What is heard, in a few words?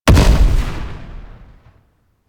Grenade Explosion A; Warframe; Corpus; Jackal Redux; Weapons